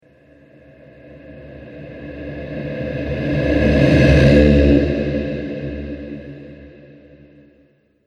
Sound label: Haunting Halloween Spirit, ghost